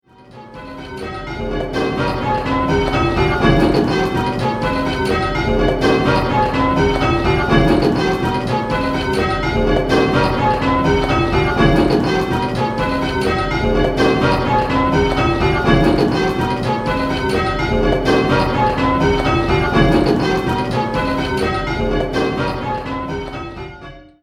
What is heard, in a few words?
call change, 8-Bell